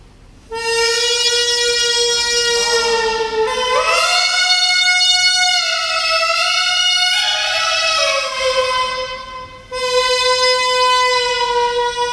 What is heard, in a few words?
Banshikicho No Netori, hichiriki